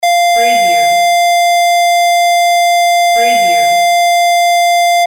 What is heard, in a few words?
beep